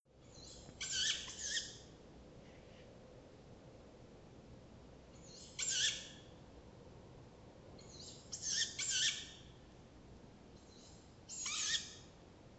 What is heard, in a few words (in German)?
waldkauz